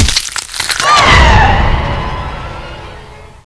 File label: zombie pullout; passzombie